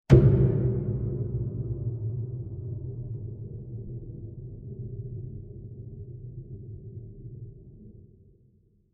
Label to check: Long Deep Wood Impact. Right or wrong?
Right